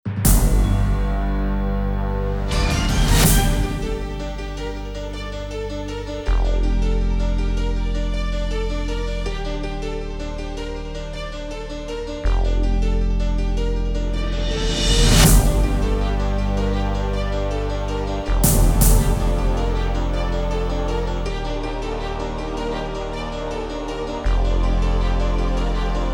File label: music